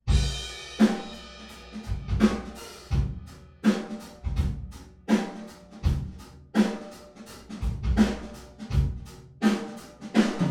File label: drum wet